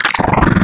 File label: Uhaul, Growl Tables; Cymatics; Growl Tables Vol 3; Serum